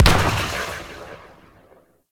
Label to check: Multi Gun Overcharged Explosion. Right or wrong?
Right